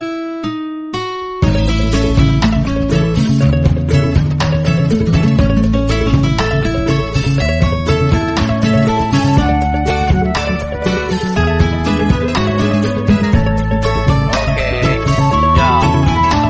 keroncong nada dering

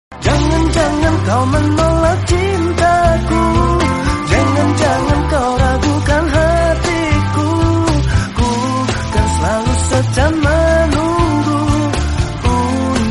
baru lagu indonesia nada dering ringtone

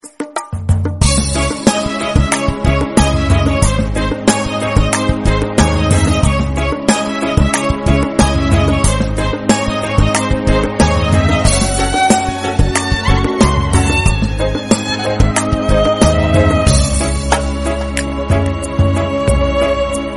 campuran arab indonesian nada dering

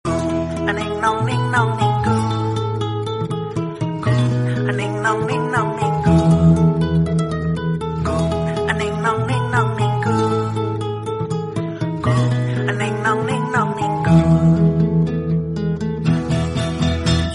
ding dong indonesia nada dering ringtone

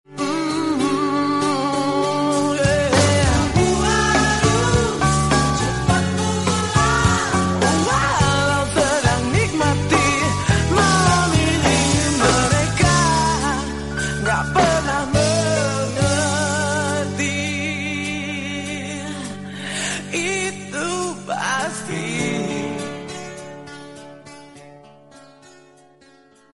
lagu terbaik nada dering

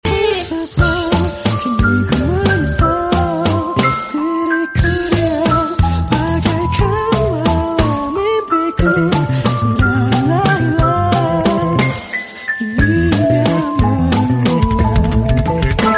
klasik nada dering

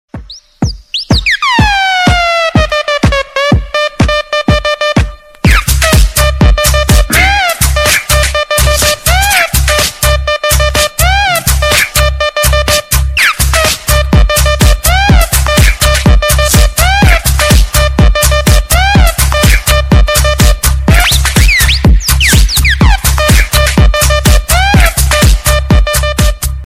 nada pesan ringtone

